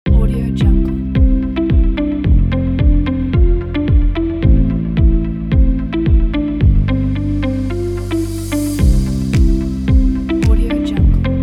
upbeat corporate electronic

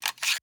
gadget small metal slides and latches

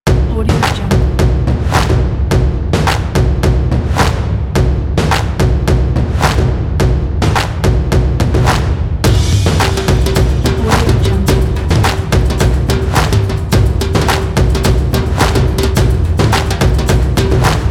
powerfull action percussion

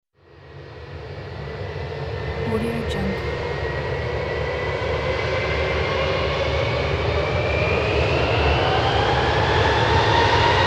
takeoff

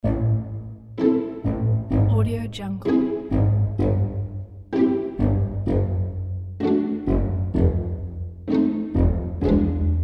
funny

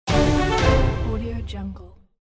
pirates small win

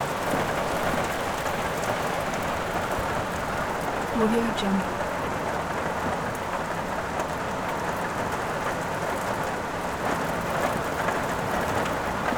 rain loop

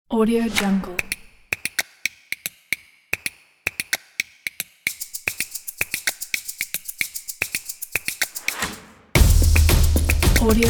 the percussion

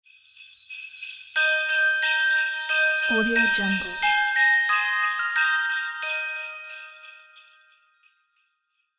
christmas logo

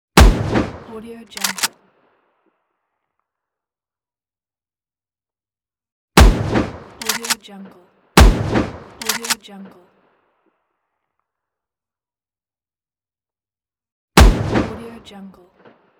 shotgun cocking

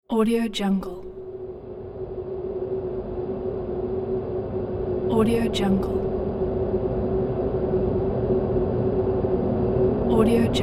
wind of nightmares

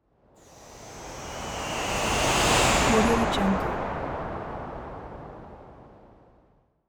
christmas transition santas sleigh screech whoosh; esmteam

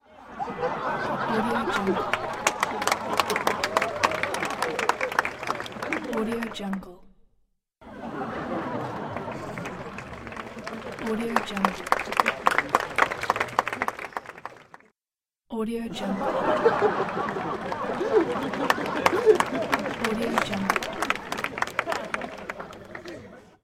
audience laughing and applauding

